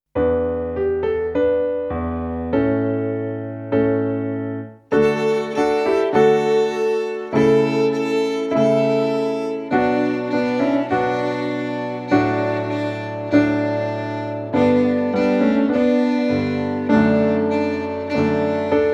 Classical Sounds

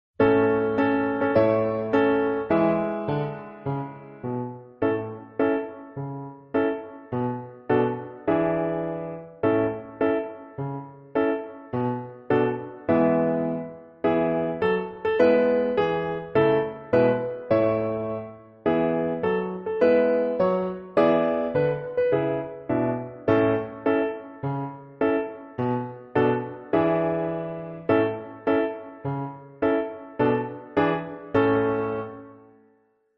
Oom Pah Band Accompaniment Track

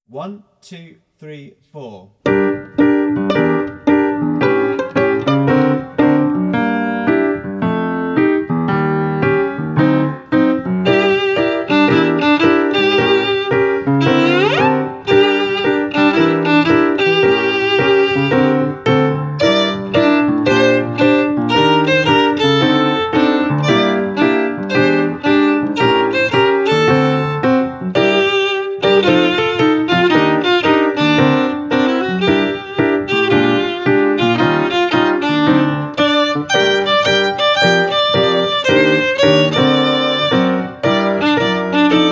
Violin; Canciones de pociones mágicas

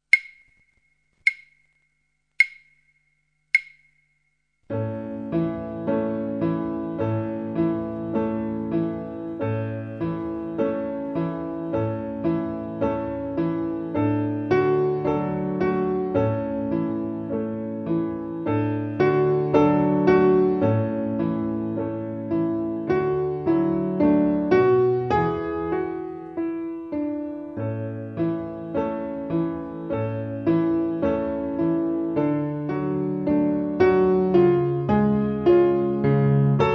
piano

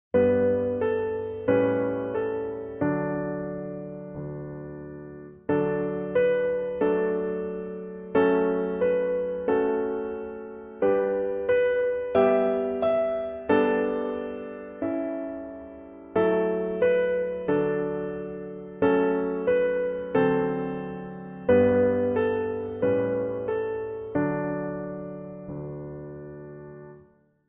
Apple Tree Accompaniment Track

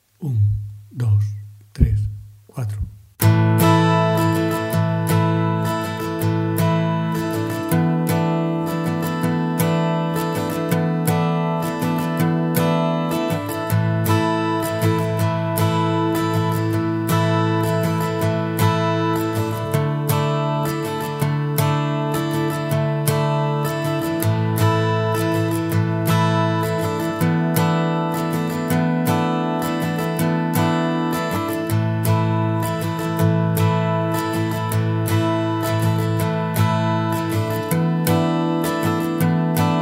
Fox Polka

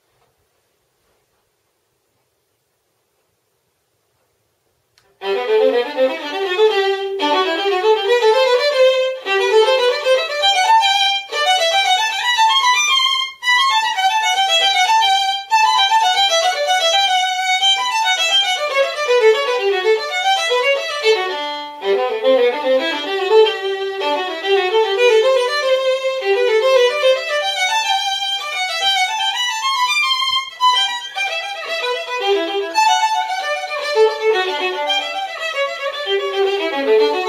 violin etude no 6 etudes speciales op 36 book 1; J F Mazas

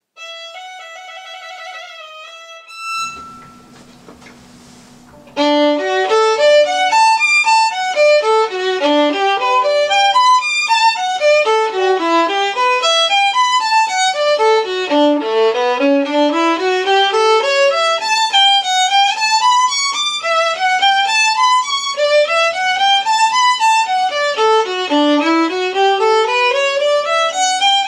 Violin Etude no. 36; Franz Wohlfahrt; Op.45; from Book 2

